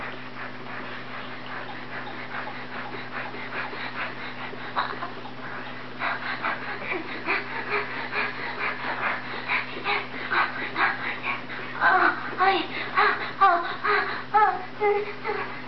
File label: sqeaks grunts orgasm, Silly Lo-Fi Orgasms